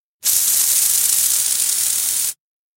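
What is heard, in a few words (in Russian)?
звуки датчика дыма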